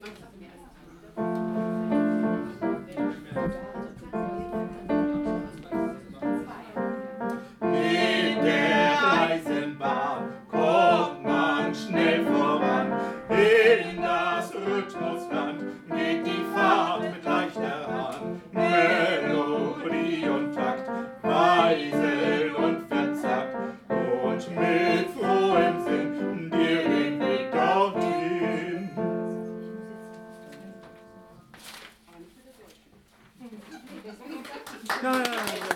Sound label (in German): Lieder